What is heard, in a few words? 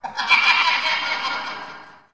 npc ghost; Undercroft